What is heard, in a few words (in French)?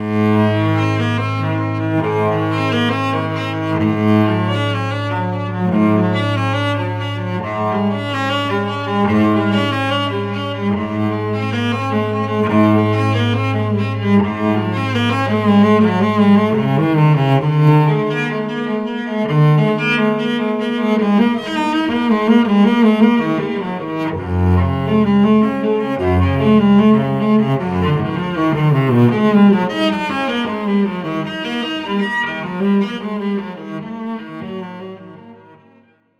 Suite de bach violoncelle solo